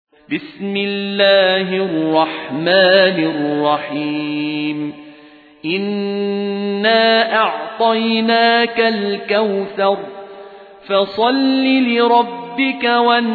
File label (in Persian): سوره کوثر قالون عن نافع; الطرابلسی